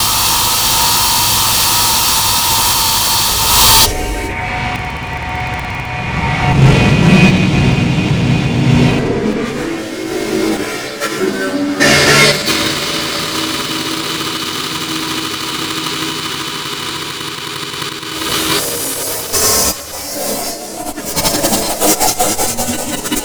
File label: ventilator